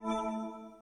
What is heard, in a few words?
Lock